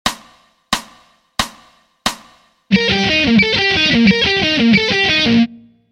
Sweep Picking Eb Triad; Motif

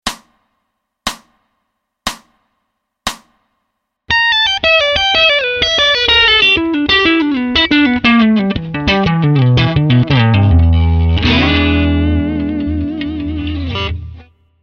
Blazing Blues Pent slow

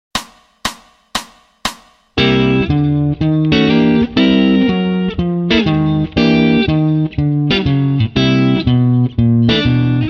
C7 Walk Plus 4ths Voicings fast; stemming from the 5th string, to outline an overall “C7” sound; Walking Basslines Bonanza

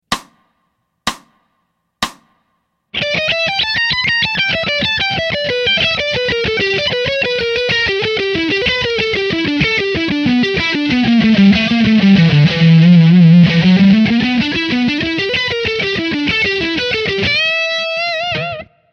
grooves picking slow

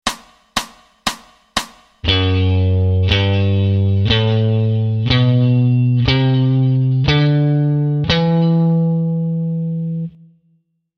D Mixolydian 6th String

